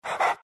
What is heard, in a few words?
pant small, greevil; courier